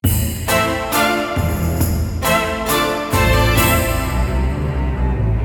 Casino In; JewelResort; Granblue Fantasy Versus

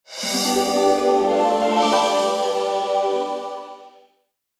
Bouncepad (Short); Golden Desert

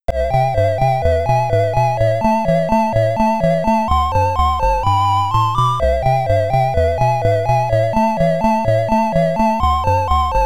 Finish! (SID Stereo); paperboy; commodore 64

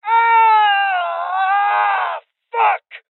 Injury Male Young; rebel cops 2019 gamerip; Voices

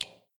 metal ladder footstep; adams venture origins

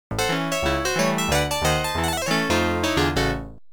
PIANO; Twilight Zone; pinball

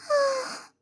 Moan; My Cute Commissar

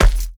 Footsteps Dirt Run; Devour; The Town

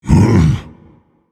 Vocal Colossus Pain Reactions

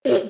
windup

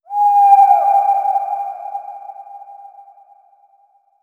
environment owl

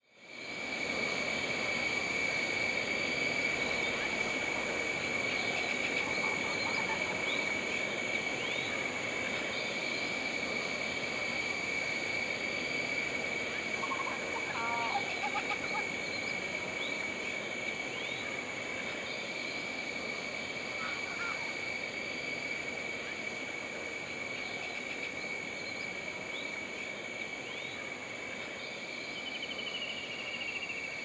Jungle Ambience; Animal Crossing Happy Home Designer

